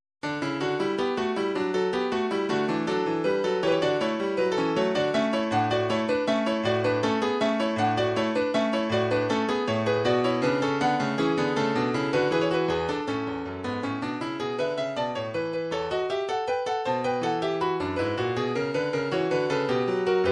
piano, midi

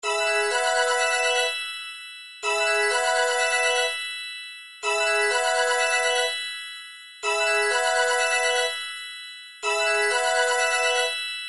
ringtones, midi

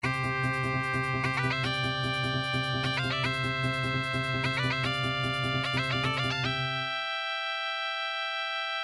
victory; nintendo mega man ii

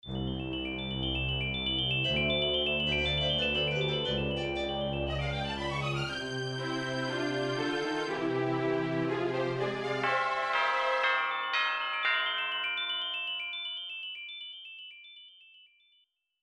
victory; nintendo 64 paper mario; over bowser

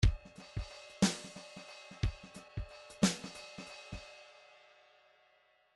grooves 3 4

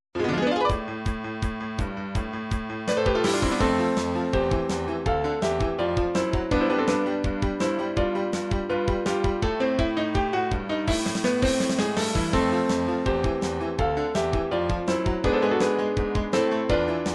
piano remix, midi; super nintendo final fantasy ii fight 1

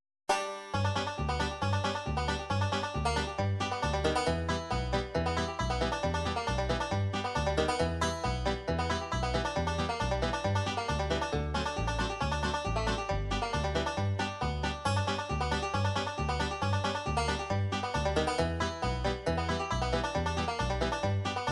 bluegrass

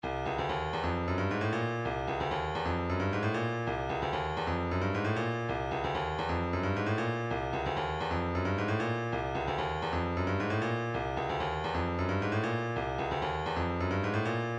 room, midi

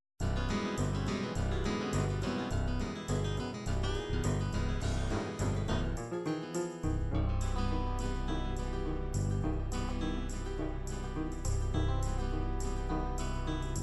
ballads ballad folk rock, midi

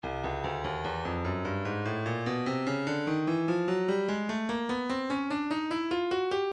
breakbeat 074 bpm; crossroads; Stylus Breakbeat Midi Files

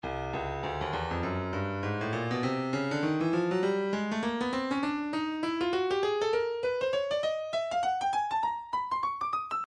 breakbeat midi 100 bpm; wuzzup a; Stylus Breakbeat Midi Files

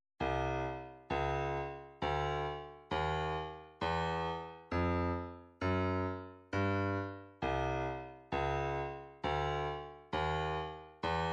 133 snare; Soweto

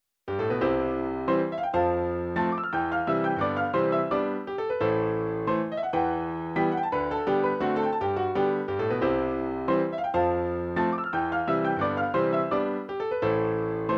waltz, midi

